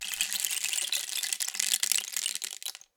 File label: Rain Stick, Percussion Samples